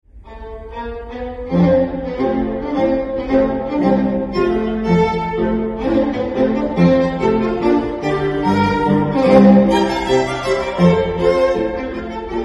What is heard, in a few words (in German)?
Ungarischer Tanz Streichquartett; Brahms Ungarischer Tanz 7